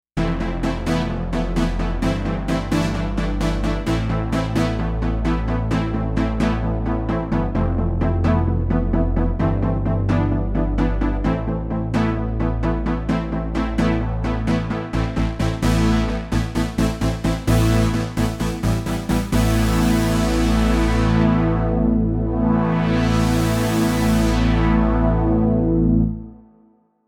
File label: polysynth; JUNO-Di Patch Demo